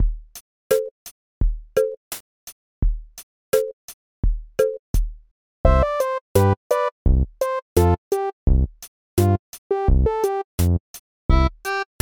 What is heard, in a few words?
Boopbeep